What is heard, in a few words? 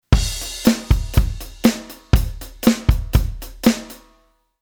Quantize Rand; Midi Basic